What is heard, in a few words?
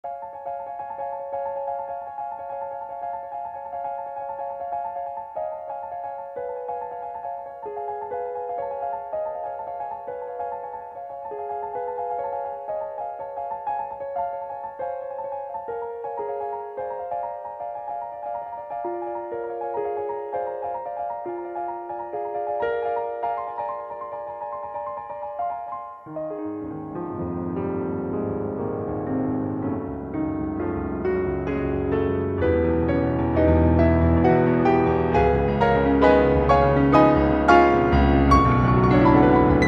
Stereo Dynamic Piano; k2600; ROM Option; ONDINE